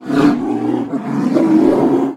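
ustrashayushij raskatistik medvezhij rik, intimidating rolling bear roar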